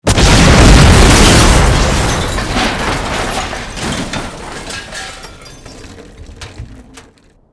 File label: car death; Mafie 1